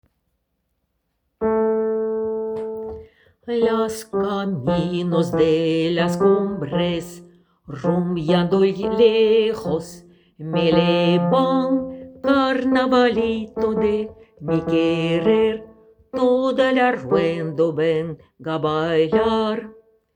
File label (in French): Carnavalito voix grave femme la fin